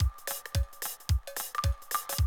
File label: Classic DrumLoops, 110